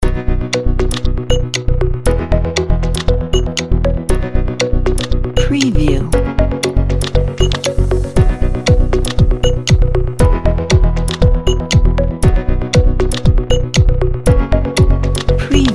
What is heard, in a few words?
technological vibe